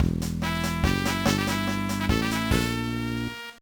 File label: Jingle, gba; Backyard Baseball 2006